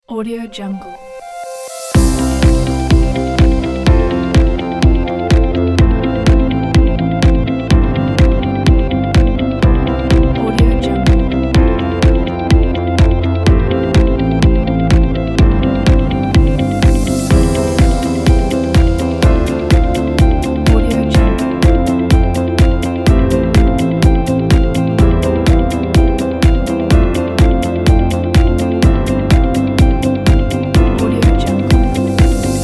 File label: action corporate kit